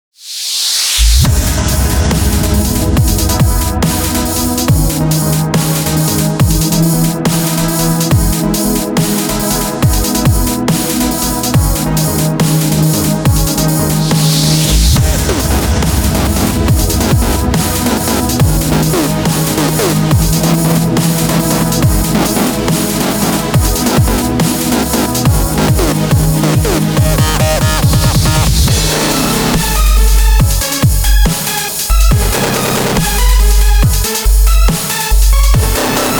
Dubstep Remix; Pony Music Archive

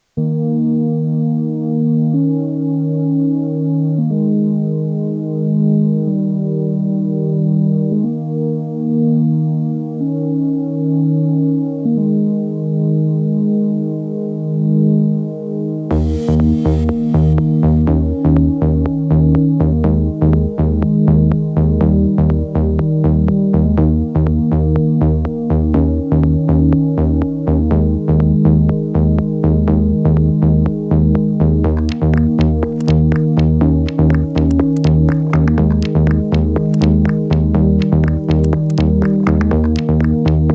some house y stuff

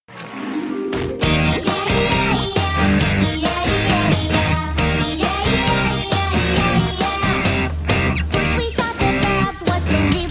with guitar

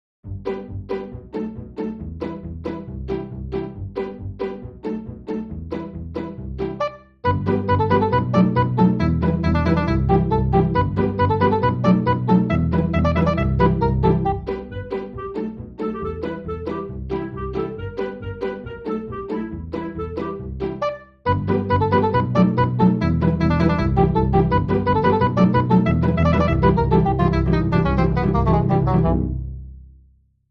Orphanage Song (in G major) (Instrumental); Friendship is Witchcraft

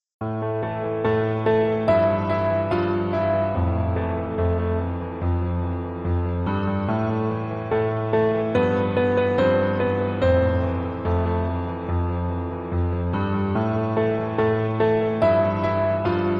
Piano

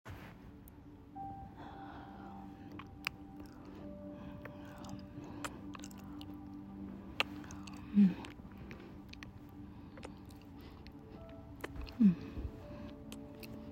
mouth noises throat noises spit sounds; F4M; A Very Short BJ

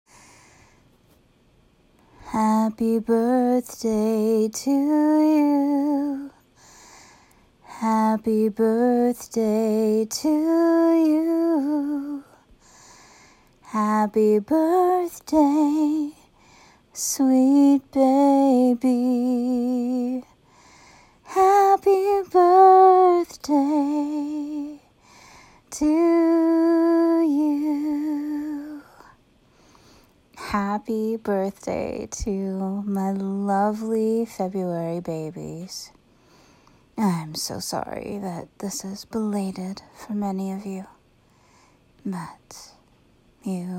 happy birthday, singing; February Birthday Babies; [message]

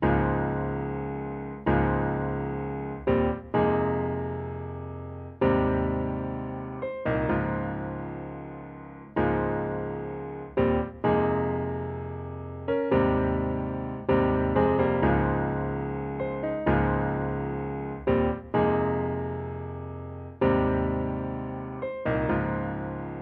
Piano; Pony Fan Music Remix Stems; 128BPM

